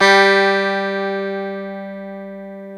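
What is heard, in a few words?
guitar electric 12 string; Club-50 - Foundations; E.12 String; Ricky12Str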